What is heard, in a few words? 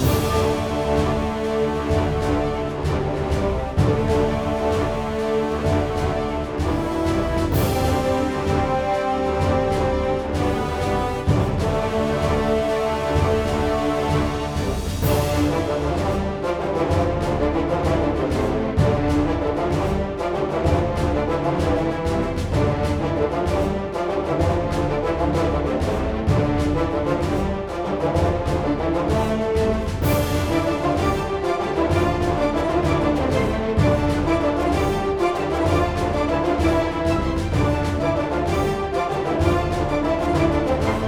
Music Fight Pirates Theme Hard Bpm128 Loop; asterix obelix slap them all 2